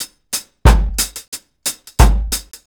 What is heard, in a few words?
RG90 DRUM, REGGAE